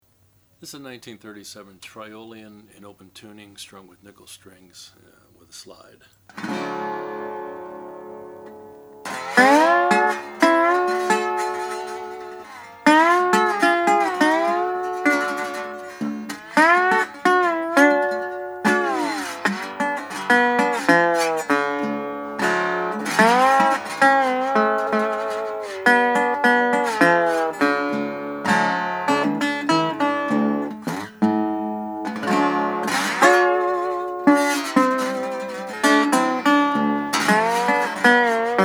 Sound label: national triolian rosewood